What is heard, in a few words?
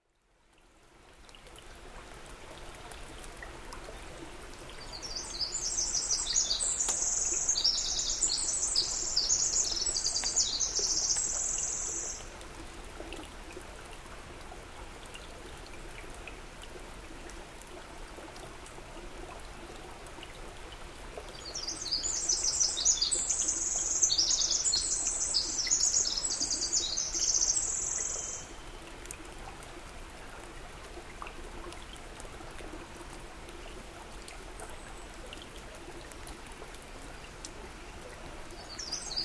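winter wren; Winter Wren in alder and spruce at the beaver pond inlet of a wooded lake, Clatsop County Oregon.; Microphone: Shure WL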